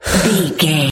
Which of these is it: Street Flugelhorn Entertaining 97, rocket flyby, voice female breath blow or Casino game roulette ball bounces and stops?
voice female breath blow